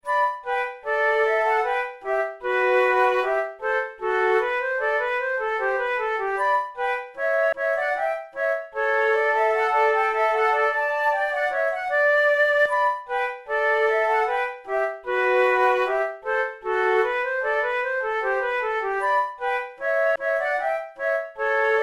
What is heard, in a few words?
gavotte, flute